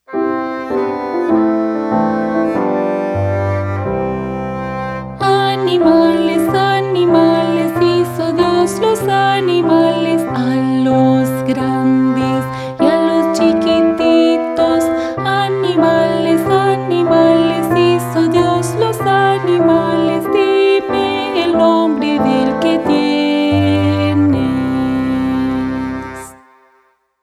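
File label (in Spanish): Animales, animales, Cantos; principiantes 2026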